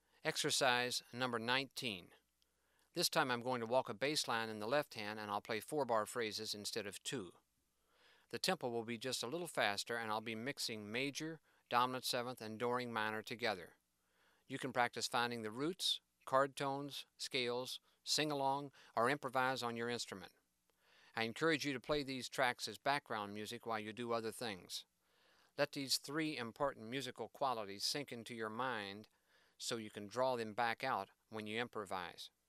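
Walking Bass Line Major Minor Dominant 4 Bars Each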